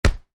Volleyball block medium, Volleyball (Exterior): Block Medium; Sports; Miscellaneous Sports